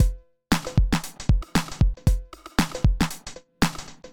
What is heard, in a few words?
Boogie drum